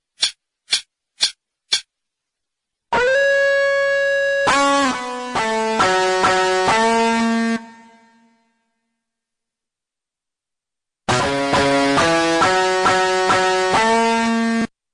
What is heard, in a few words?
intro 68